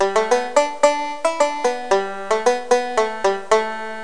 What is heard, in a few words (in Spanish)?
BANJO; Pipo-Aprende musica con Pipo